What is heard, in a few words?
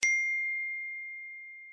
chat Notification